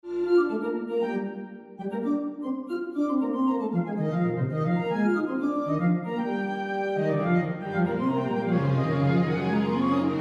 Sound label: Serenade